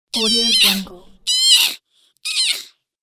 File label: cartoon insect pushing moan